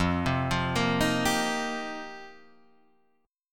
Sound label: F 7th Suspended 2nd Suspended 4th, Guitar Half Step Down; F7sus2sus4